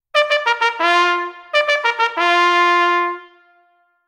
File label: Dismount, Cycling Bugle; henry keat & sons; Bugle Calls; c1880 Cycling Bugle